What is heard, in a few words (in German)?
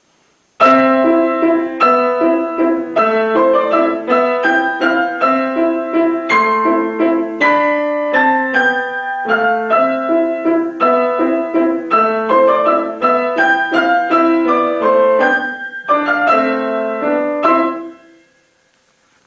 Kleiner romantischer Walzer; 4-händig